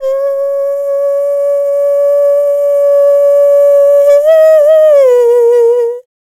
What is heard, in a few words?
Dance Vocals, FEMALE